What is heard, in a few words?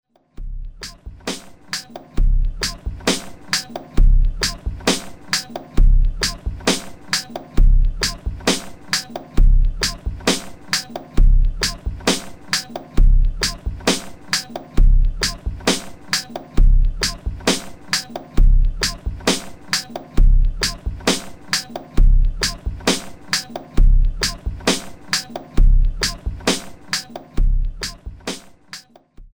Loop; SOMETHING VINYL SERIES